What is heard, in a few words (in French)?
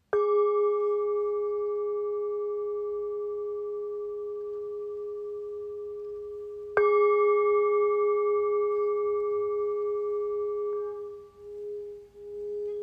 427 gr 130 mm 410 htz sol